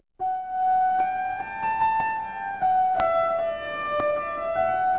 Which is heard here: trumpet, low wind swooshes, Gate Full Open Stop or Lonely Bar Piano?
trumpet